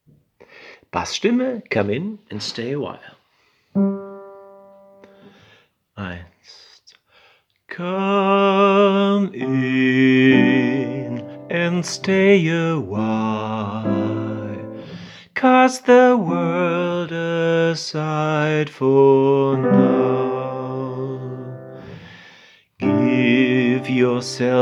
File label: Come in Bass